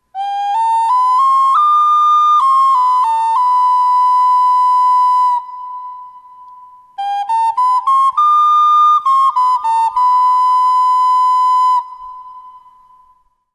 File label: ocarina